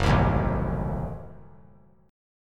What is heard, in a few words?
Gb Diminished 7th; strummed; JGuitar